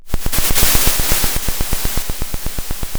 Sound of a drum roll

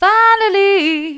finally soft, vocal

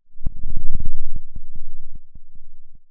pop

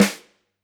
Lud Rim, drum

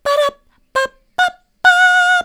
bada bap, vocal

